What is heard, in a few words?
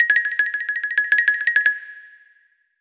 Marimba; musicradar; cartoon caper